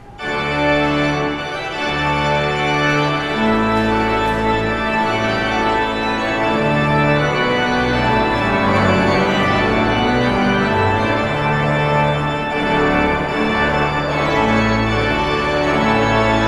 Postlude

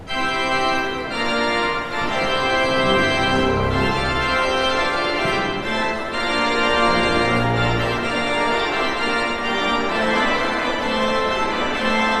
Postlude; Darwalls 148th

